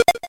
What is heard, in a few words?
shell hit; Blossom Whisper; smbx38a; The Great SMBX 38A Contest I